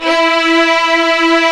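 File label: strings, Vlns 8 fff; Roland L-CD702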